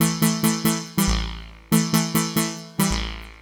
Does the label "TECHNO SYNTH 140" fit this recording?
Yes